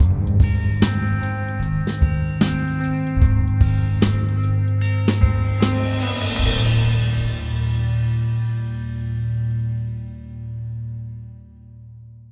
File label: Game Over